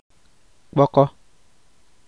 peel with hand or knife as kola ndiya cassava unlock ble; ibibio